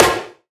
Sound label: Acoustic Drums - Snare; Antidote Advent